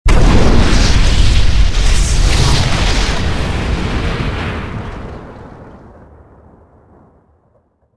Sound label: explosion